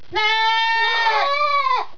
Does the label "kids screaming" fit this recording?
Yes